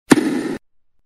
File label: hit ball